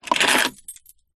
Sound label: car keys pick up from internal plastic car tray